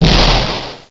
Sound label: damage